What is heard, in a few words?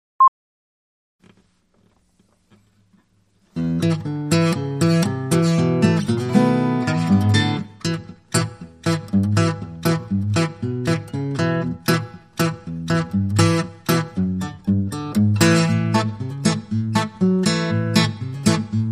Delta Blues Duet